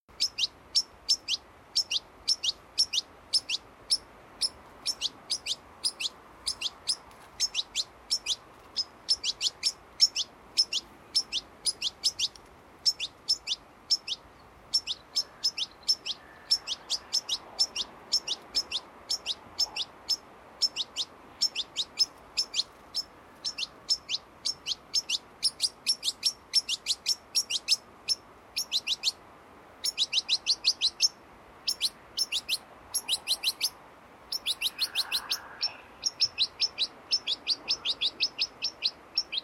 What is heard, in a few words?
Carduelis carduelis; SETE